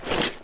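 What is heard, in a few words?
noise of climbing gear dumped in a pile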